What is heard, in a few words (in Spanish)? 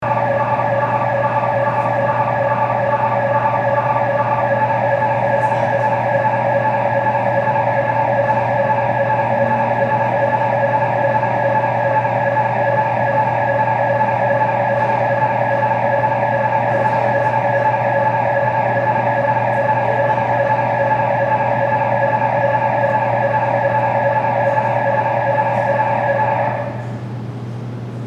ALARMA DE AUTO EN ESTACIONAMIENTO DE SUPERMERCADO A LAS 3 AM; WONG